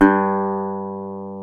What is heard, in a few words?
GTR Dobros National; Club-50 - Foundations Roland